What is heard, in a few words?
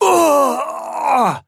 pain big; Trapper